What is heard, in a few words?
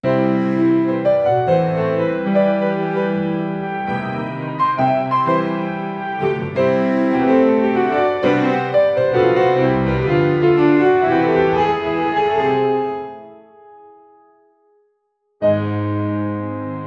majestic classical piano